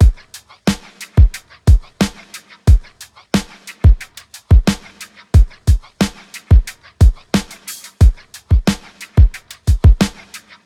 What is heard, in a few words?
ui debugmusic 90bpm; Just Dance 2021